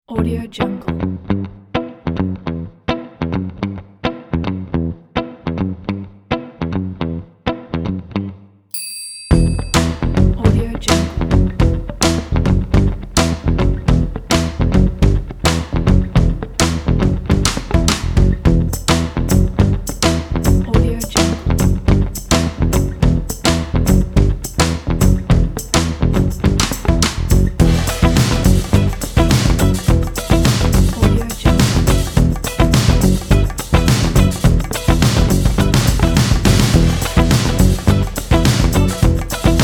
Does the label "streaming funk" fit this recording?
Yes